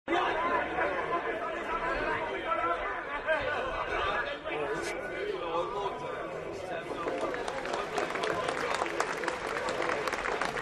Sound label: jazz tavern; bienvenue a la taverne